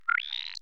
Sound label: Creatuer